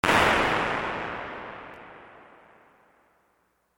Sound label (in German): Kathedrale